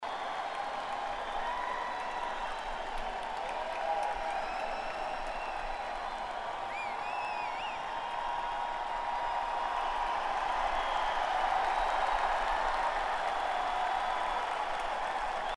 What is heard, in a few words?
Crowd; Gainsville, VA; Nissan Pavilion at Stone Ridge